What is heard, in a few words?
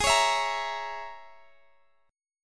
Bb Minor 7th Flat 5th